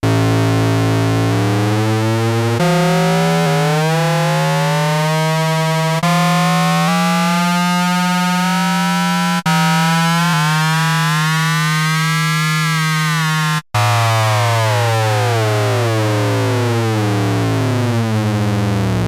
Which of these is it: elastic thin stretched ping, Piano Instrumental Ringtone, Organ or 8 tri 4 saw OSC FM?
8 tri 4 saw OSC FM